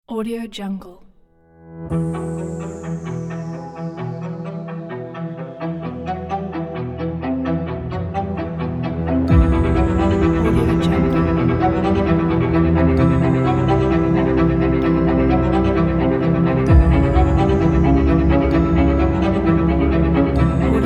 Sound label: cinematic epic trailer; soundgrenade